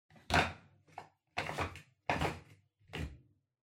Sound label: Washer Front Load Filter Lower Insert